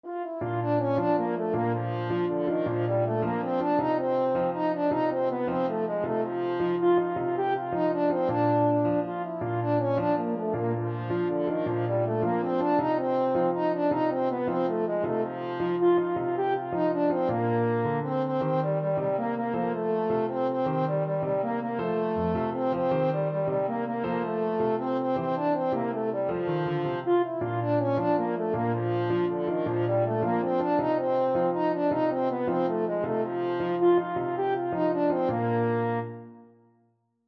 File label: mexican hat dance, french horn